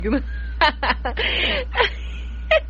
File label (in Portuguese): risada